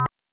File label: hint close